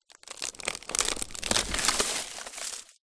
foley palm tree leaf branch fall off tree

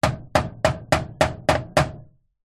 industrial metal wrench tap hit metal panel; zapsplat

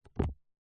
book large heavy grab with hand; household

